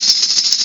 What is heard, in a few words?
poison